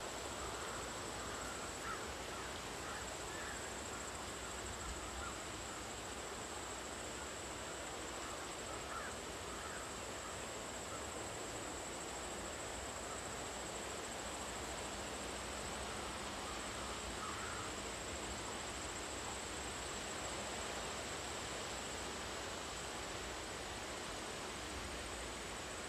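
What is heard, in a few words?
Ambient Cliff Loop; Silent Hill Homecoming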